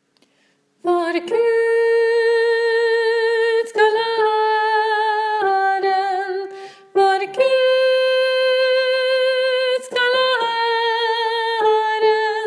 sopran; åfjord

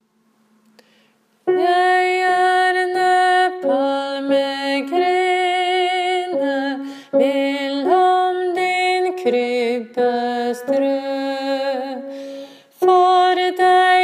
mitt hjerte bass; åfjord

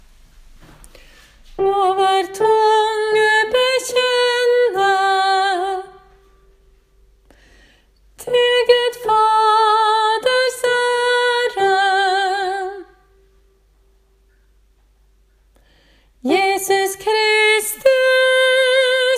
sopran; kristushymnen side3; åfjord

